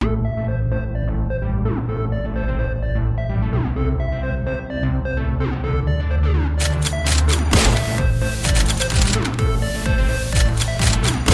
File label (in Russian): sintez karti impulsa vedushego zvuka bistro migayushij zvuk pistoleta zvuk ritm korotkij; saundtrek